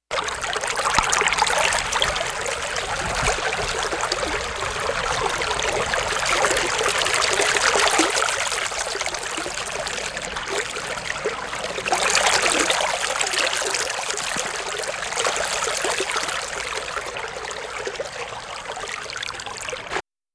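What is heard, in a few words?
Brook Water Streaming; streams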